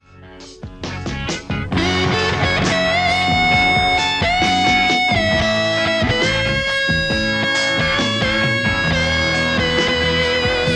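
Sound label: Stratocaster; mercuriall harlequin v1 3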